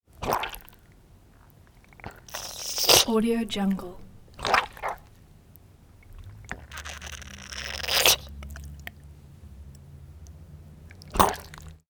gross wet retching sounds constant

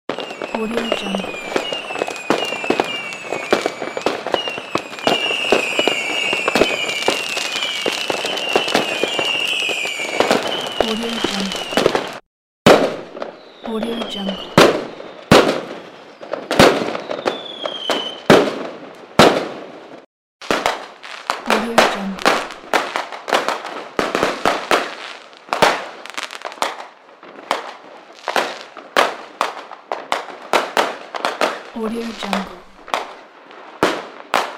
fireworks rockets pack

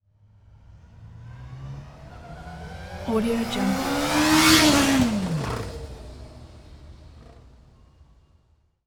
yamaha fz8 passby; soundmorph

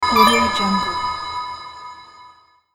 collect reveal atmospheric

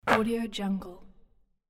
basketball net

